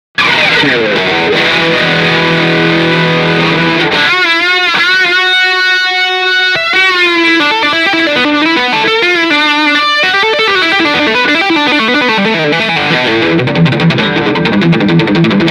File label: All on boost